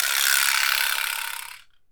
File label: VIBRASLAP; AKAI S6000 CD-ROM - Volume 5; GM PERCUSSION KIT